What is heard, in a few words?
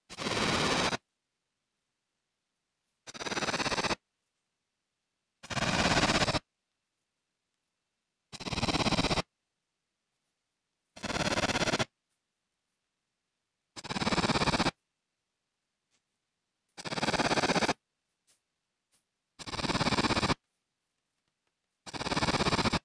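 Heterodyne R germanica captif; Casalabriva-2A; J-Barataud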